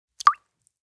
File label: Golf ball into a water hazard